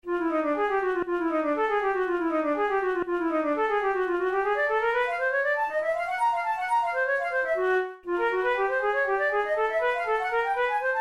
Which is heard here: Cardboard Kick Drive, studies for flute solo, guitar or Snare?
studies for flute solo